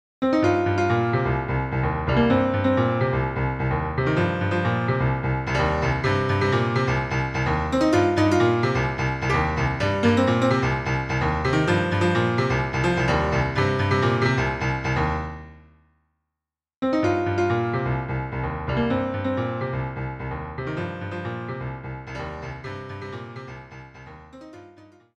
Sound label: Piano; SimCity 2000